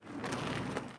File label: catapult walk; propack